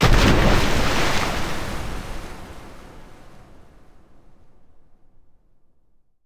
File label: hit; RS Games Sounds; Battleship